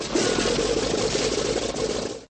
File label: plane not starting; sfx